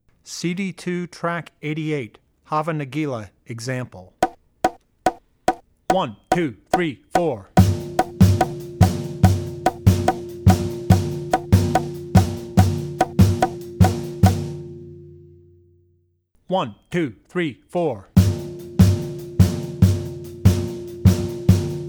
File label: Wedding Dances, Hava Nagila; Drummer's Bible Tracks